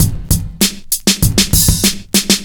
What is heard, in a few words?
98 bpm breakbeat e key